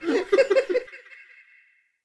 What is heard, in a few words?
tower attack; propack